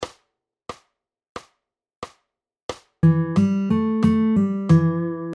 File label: Pentatonic Riffs